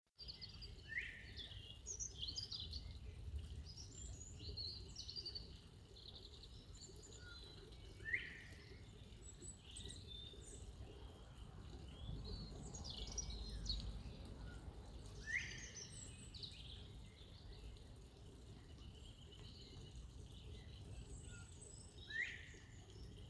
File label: bird call